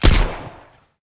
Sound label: pistol